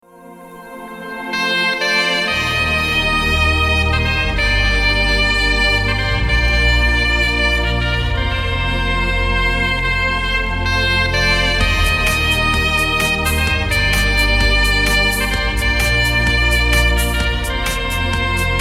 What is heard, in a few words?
infinity loop